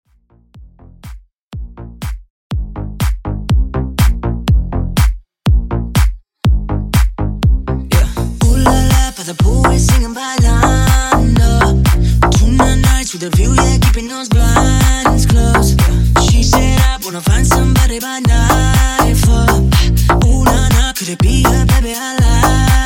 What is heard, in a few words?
Top40 Brazillian House Mashup 122 Bpm